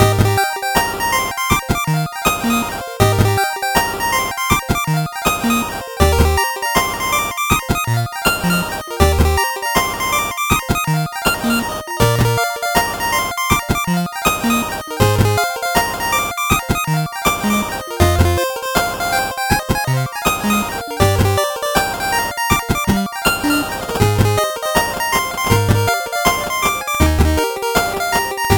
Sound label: 8bit bgm fast